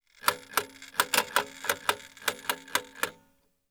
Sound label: Old Metal Clock; 99Sounds; The Warehouse